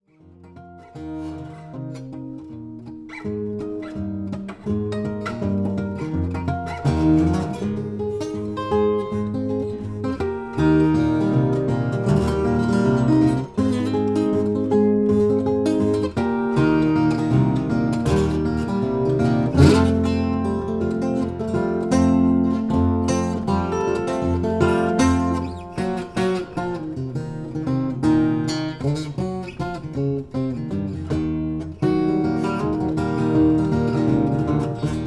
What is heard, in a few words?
Gibson '65 B4512 Acoustic 12-string